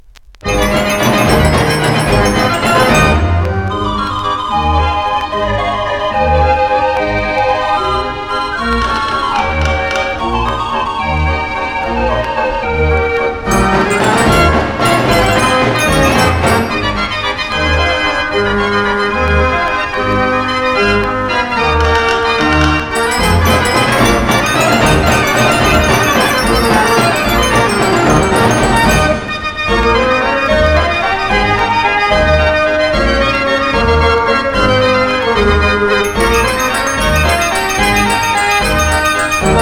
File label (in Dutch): draaiorgel; de waterpoorter